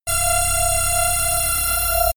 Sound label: intensity raising beams, laser; krieg-waffen; soundpool